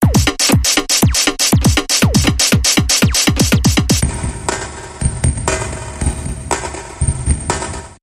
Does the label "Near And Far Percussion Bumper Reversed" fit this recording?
Yes